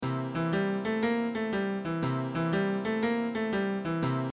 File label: Blues Tonica